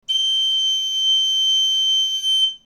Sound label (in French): sol, galoubet; Note Sol - au-dessus de la 5éme ligne; Gal ut